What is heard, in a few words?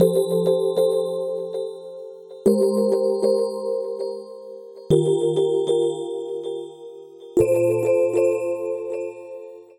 R&B Keys Chords F Major 98 bpm; MIDI Clips; Accompaniment; Ableton; Factory Packs; Chop and Swing